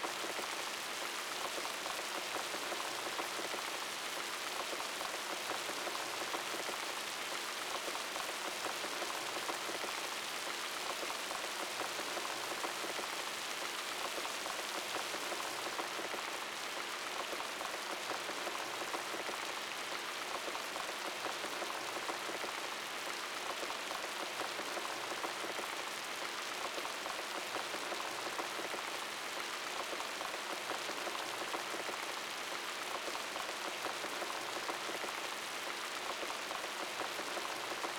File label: rain